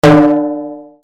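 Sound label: percussion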